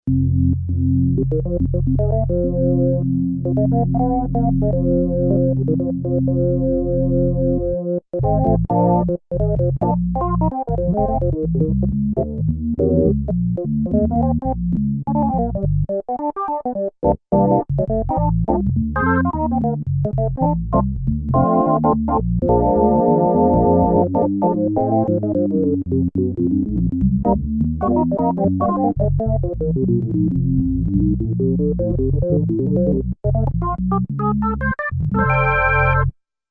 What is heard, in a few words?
jazz organ, Korg DW-8000; Factory Patches